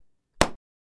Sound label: Gavel Sngl Hit